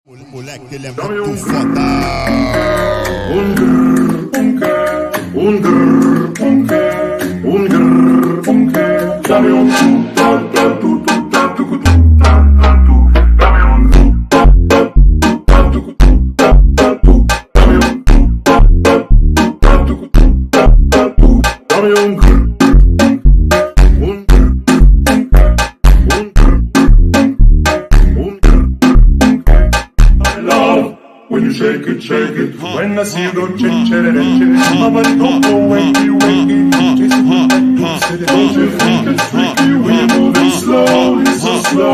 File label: un grr funk tiktok slowed reverb